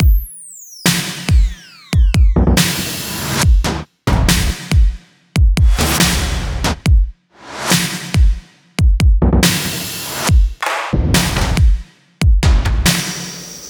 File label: Butter Drums; Trap (Трэп)